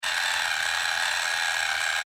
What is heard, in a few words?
Servo Mechanical SciFi Robot Toy, Scifi Servo Mechanical Robot Small Motor Toy